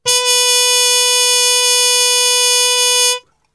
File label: B 2, Brass; Best Service; Brass Super Section; TRP HARMUTE; 90 s Sample CDs